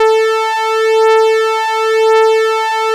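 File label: ARP lead A3; Sonic Reality; Vintage Timetraveler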